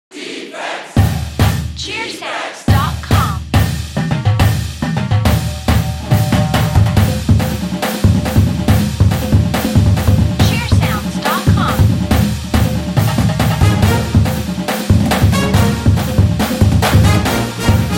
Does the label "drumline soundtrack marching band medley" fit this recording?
Yes